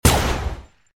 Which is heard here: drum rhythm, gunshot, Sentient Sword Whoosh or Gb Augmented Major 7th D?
gunshot